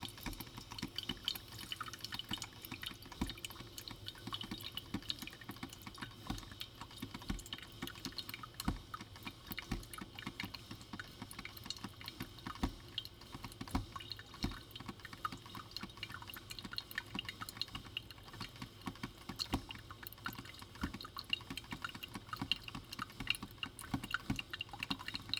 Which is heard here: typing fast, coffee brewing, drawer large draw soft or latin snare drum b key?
coffee brewing